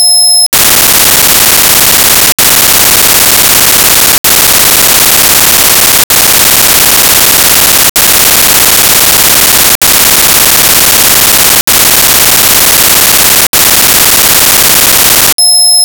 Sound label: OFDM comp12dB